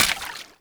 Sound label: Steps Water, Footsteps; Enemies; Foley; Warframe; Grineer Royal Jester